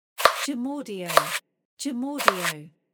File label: Vegetable Cutting